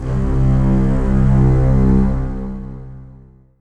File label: Roland JV-880 Strings C3